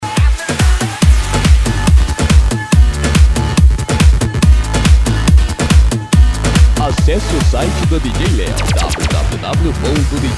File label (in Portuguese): dance sertanejo remix eletro funk e pancadao; Studio 13